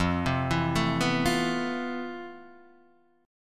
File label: F Major Sharp 11th Guitar DADGAD, chord